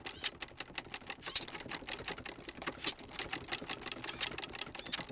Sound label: Pinky ass Rattle W Servo; monsters vocalizations; DoomRTX